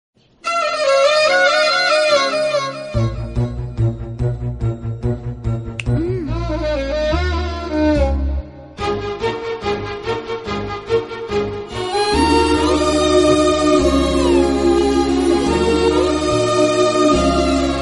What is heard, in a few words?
Violin BGM, Telugu; Yamadonga